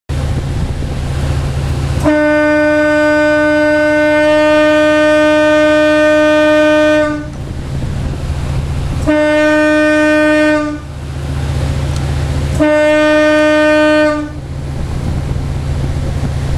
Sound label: horn